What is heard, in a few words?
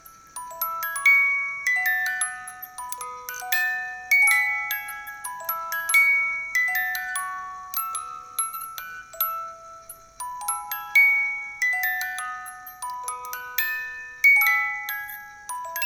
Music Box Loop